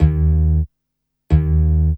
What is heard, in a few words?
GTR, Hip-Hop & R&B; USB Soundscan vol.47; AKAI